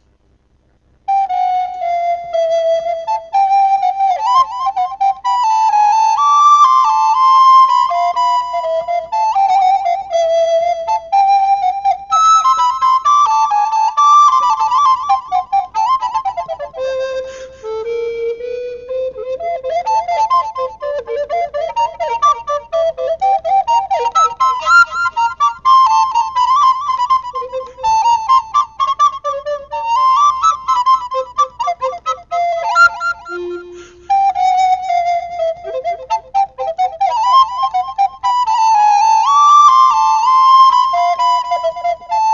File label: polka alto; MelBay